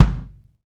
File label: KIK, Real Kiks; Club-50 - Foundations Roland